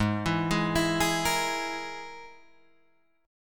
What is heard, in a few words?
Ab Suspended 2nd Sharp 5th Ab, Guitar Drop D; down